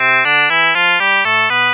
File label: scale harmonic, shepard tone